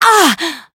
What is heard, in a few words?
Voice damaged